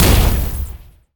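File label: Trap Energy Turret explosion; monster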